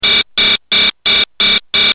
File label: Alarm Quick Bell; Blackberry Bold